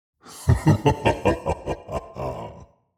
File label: Demon king lord maniacal laugh